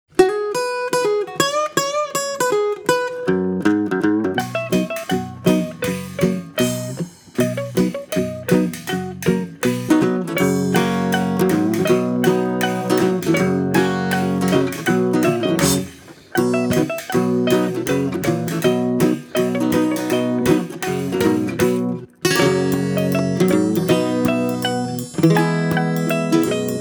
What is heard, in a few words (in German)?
Karaoke Froehliche Weihnacht